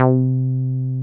303 C4; V-RES BS; Drums In Your FACE!

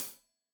Hat Tight, Hi-Hat, Cymbals; Deeply Sampled Drums

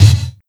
Snare; Sonic Foundry (Sony Creative Software); Esoterik Beatz; Saver Kit

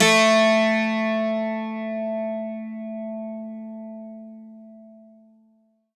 bouzouki; Best Service ProSamples vol.52 - World Instruments 2; AKAI

